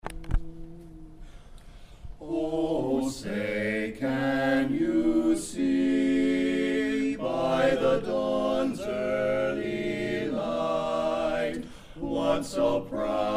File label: Quartet